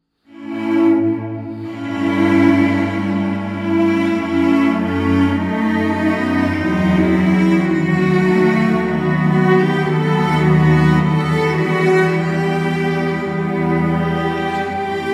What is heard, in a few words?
Sarabande from Cello Suite No. 6